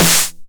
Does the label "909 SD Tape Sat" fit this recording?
Yes